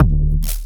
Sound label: weird bass